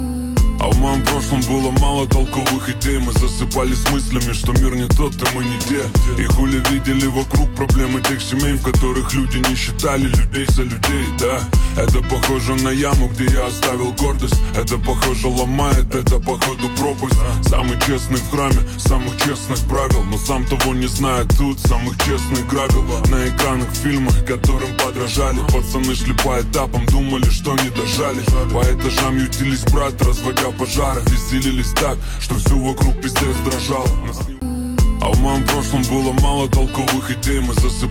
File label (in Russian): kriminalnyj bit